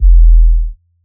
808 Boom, trap

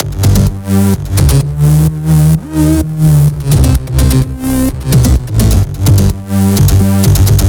Melody Kits 128BPM Root G; Vengeance Samples

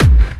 Bassdrums Clubby Kicks; Vengeance Samples

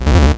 Arcade Riffs

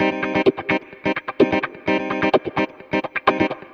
Electric Guitar - C min, 128BPM; Electric Guitar Kit; Vengeance Samples; Special Sounds

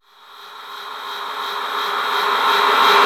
Sweeps Medium

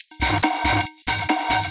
Loop 140BPM; Vengeance Samples; VTS1 Bonus Sounds

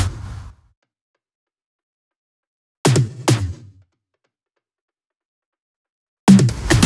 Loop 140 BPM; vengeance essential club sounds-3

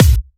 Bassdrum; Vengeance Samples; VEE Bassdrums

